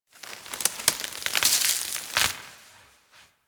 forest branch snap crunch; Ambience; Warframe; Grineer Forest

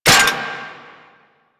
RPG Mech; Hellion Elite

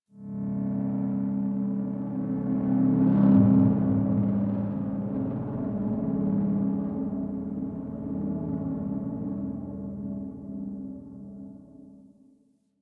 Gritty Pulsing Drone; Ambience; Warframe; NineteenNinetyNine

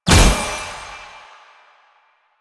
Kunai Proj Explosion; Warframe; Weapons; Choir

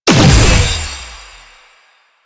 Dash Cast; Warframe; Lasrian; Lasrian Soldiers; Abilities; Butcher

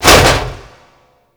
Frame Step; DE; Warframe; Vay Hek

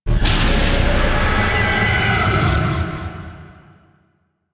UI Void Angel; Warframe; Missions; Zariman; Zariman Assassinate

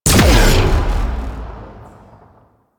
Rail Jack Weapon Three Shot; Modular Weapons

